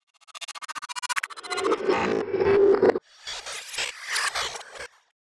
Transition

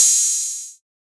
hihat open 80s; Open Hats

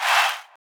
Chant, Vox

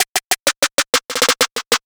snare one shot triplet 127; Snares

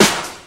Snare

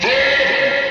vox

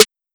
Snare

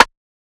rimshot; Percs

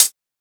Hihat, Closed Hats

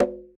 Percs

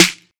SNR; Snares

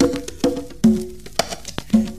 congo loop

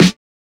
Snares

